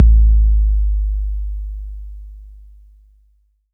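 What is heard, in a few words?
bass drum 808 Tape Decay F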